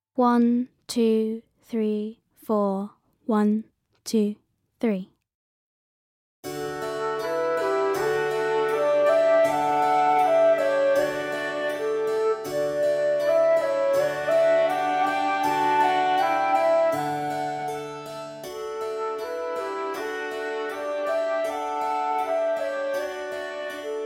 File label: musette backing track